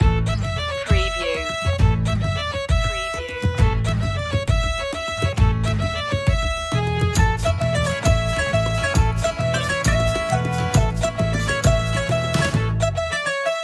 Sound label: old irish tavern